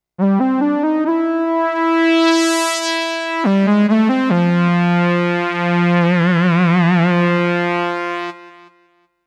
Saw Lead

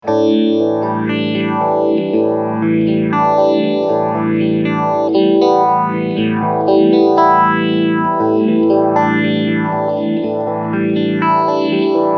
Medium Rate

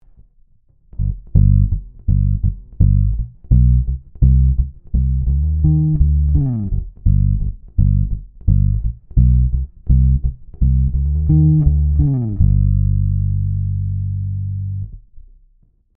Ibanez EHB1505 Neck active low boost high cut

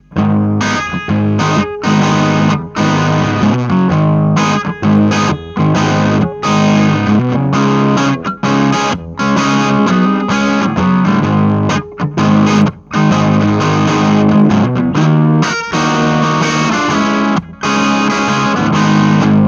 blues us

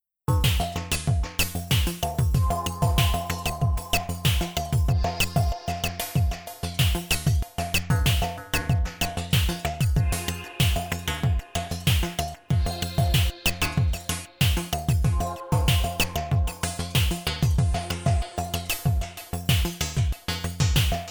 Stroke Machine Kits

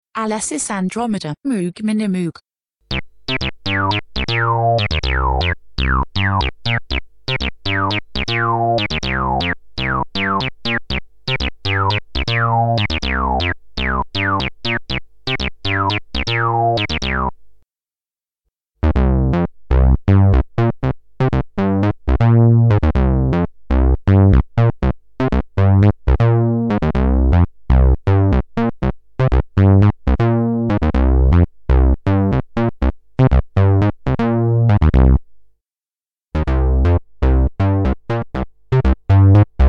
A6 Minimoog Basses

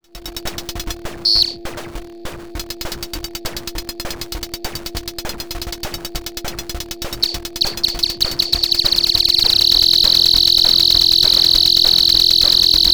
Loops And Drones

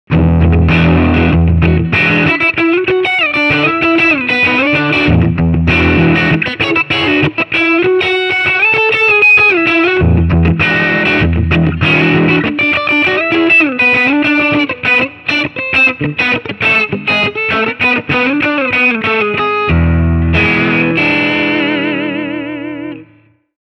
Channel Blues Drive

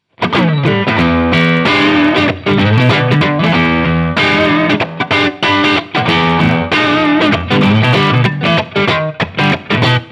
Wampler Gearbox Single Coil Blue Channel

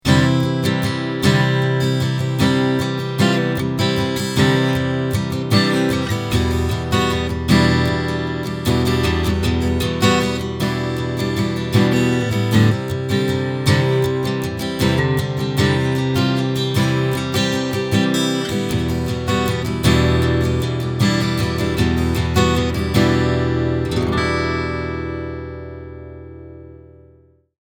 Takamine FT341 Strumming Mic

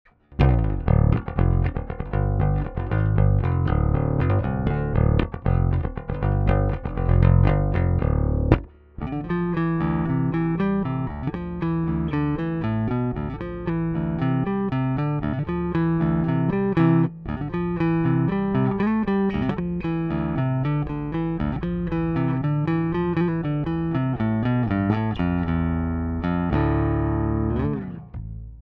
Pick full

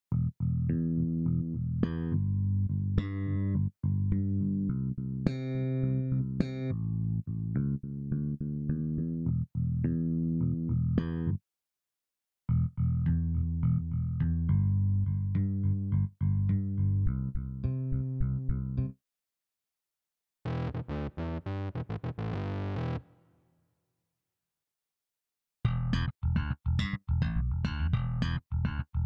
Bass

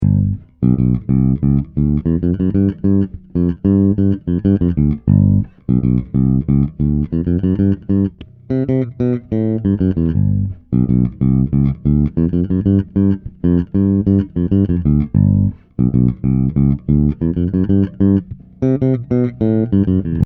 Sans Clean

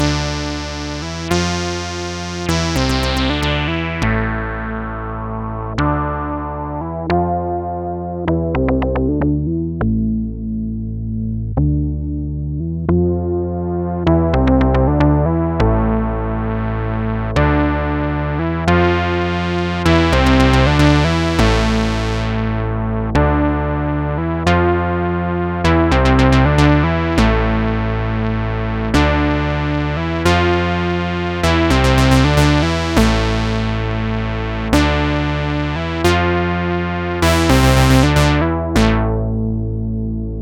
Minimax Bass; MakeProAudio

